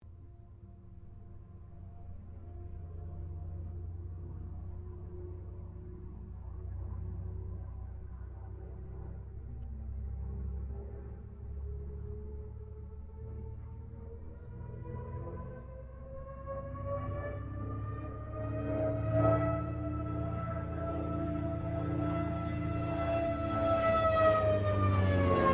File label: AIRCRAFT CA-13 BOOMERANG PASS STEREO